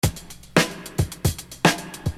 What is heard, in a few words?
Beat, Hip-Hop Street Beats